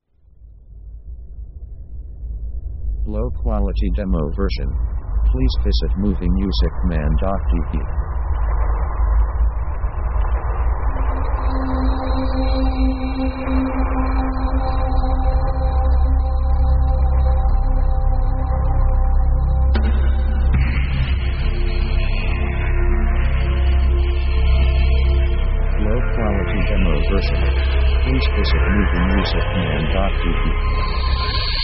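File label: synth dark atmo; synth themes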